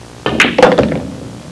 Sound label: 8 ball; HAUSHALT